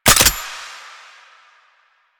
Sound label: Gunbrella Low Clip Mech, Weapons; Warframe; Tenno; Koumei